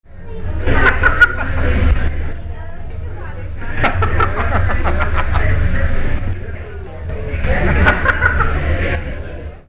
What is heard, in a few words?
laughs